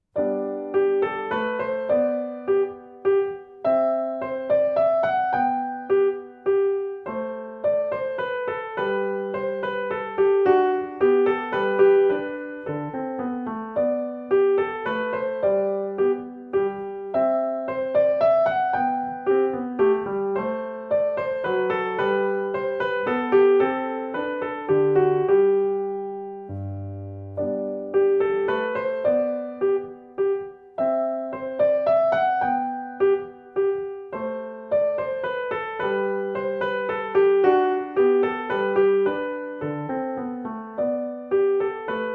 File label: minuet three